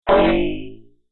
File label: Deep Electro Boing; Boing Sound Effects; cartoon sound effects